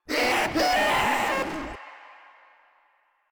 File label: Proto Infested Runner Player Spotted; Warframe